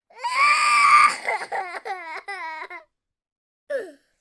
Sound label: human boy three years old cry